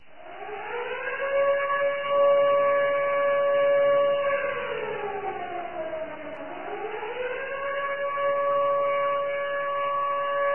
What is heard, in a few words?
siren; Source Engine; tf